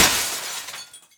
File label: windows breaking